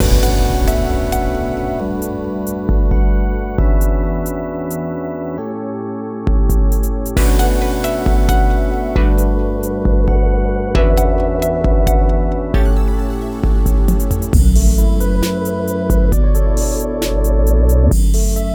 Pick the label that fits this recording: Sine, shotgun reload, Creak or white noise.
Sine